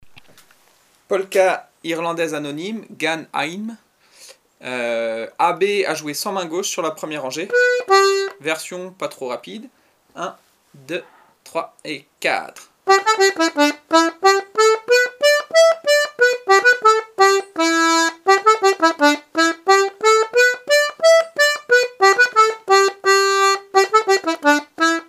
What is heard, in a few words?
polka